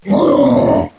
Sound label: roar